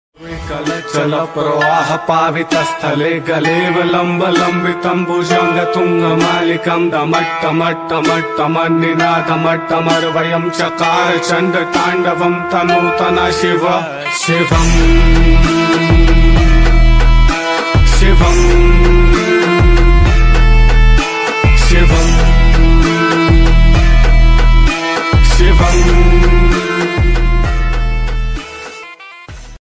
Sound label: lord shiva bgm ringtone; mahashivratri